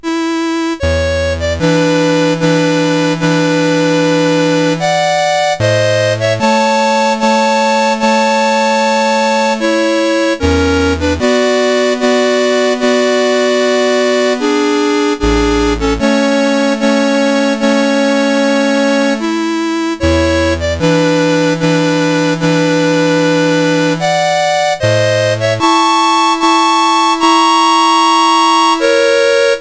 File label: Prelude in A Major, CSG8580R5; Op28 No7